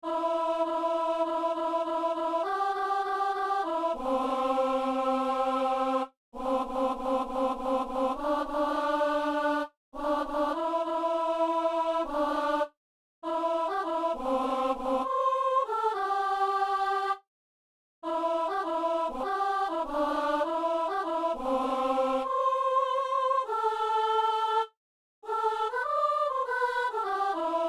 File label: soprano; Notre Père; Messe du 17 janvier à Saint-Denis